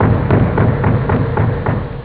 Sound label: secret knock; wrath; Amiga Soundtracker Sample Packs